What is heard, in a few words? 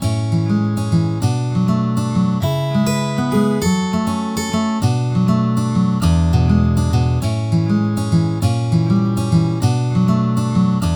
Melody Loop; Kagi; Farmville